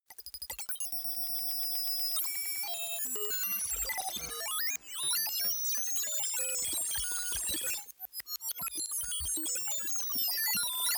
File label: data transfer chaos sequence